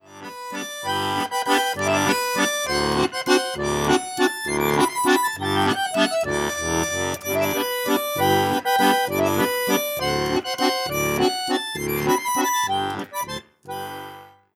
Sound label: Waltz